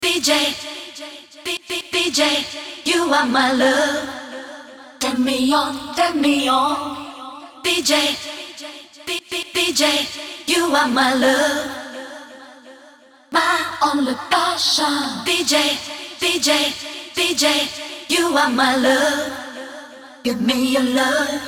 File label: (Acappella)